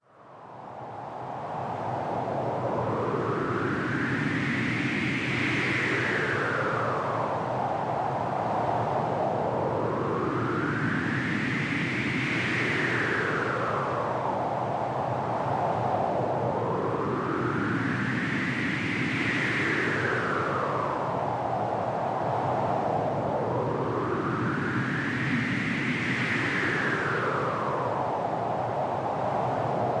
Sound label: Wind